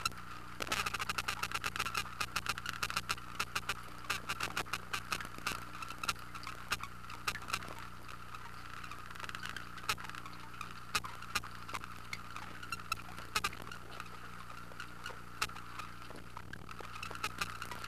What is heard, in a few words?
Prionotus evolans, filtered; Cotuit; soniferous